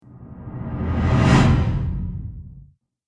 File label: Cinematic Reverse Whoosh